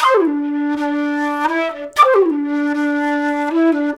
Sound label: flute, disco